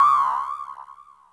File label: jump, marble; KevinBlast